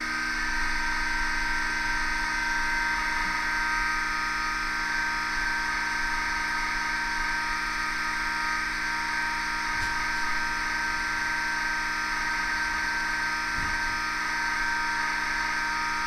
Fire Alarm Noise Wall And Firefighters Walking Around; lolicore